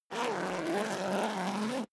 zipper down jacket open; objekte; reissverschluss

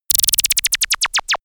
laser machinegun overload; krieg-waffen; soundpool

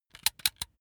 razor extend comb hard; maschinen; rasierer

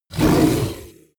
coloss roar attack, creatures; kreaturen

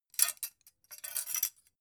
dough hook grab long; industrie-wirtschaft; gastronomie

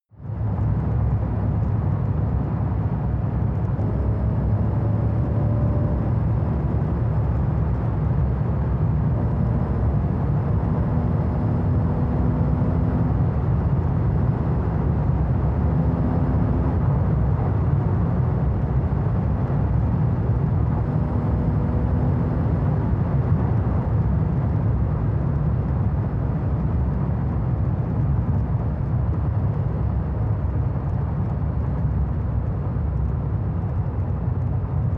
sports car int engine drive accelerate decelerate; verkehr-transport

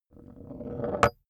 small metal rolling on wood stop; industrie wirtschaft; metall

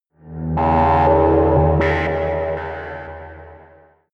sci-fi cosmic energy; horror

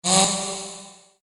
short movement sound; swooshes-swishes

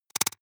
noise clicks; soundpool

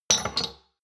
shot glasses stack slide medium; soundpool

